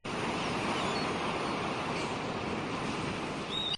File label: HORN; HotA 1.7.0